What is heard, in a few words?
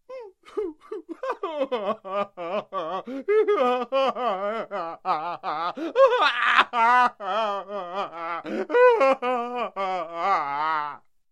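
sounds of male crying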